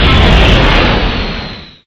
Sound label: shoot chaos